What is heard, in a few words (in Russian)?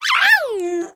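звуки волшебной феи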